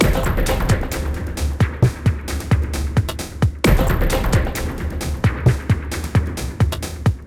Daily Chest Music Loop

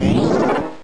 Focus Punch; pokemon reborn e19 2022

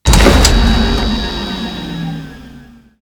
Shooting Tower Death

